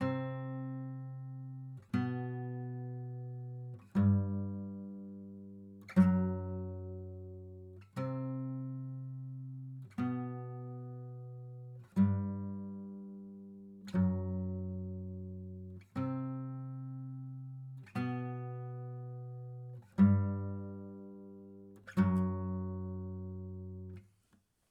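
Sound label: acoustic pattern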